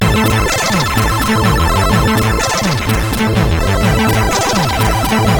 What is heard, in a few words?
X-Music Remix, CSG8580